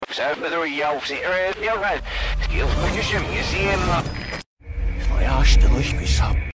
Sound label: child; reverse